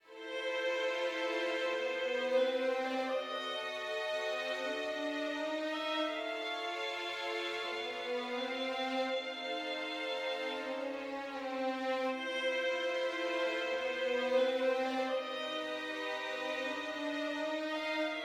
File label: dark med; Quivr; PS4 Windows gamerip 2016